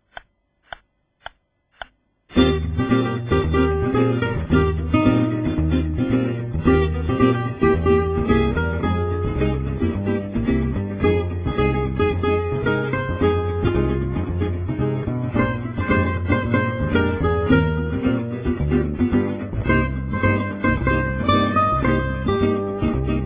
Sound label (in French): jazz manouche guitare